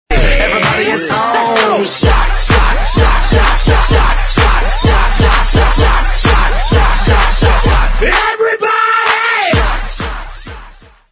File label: Shots Clap Everybody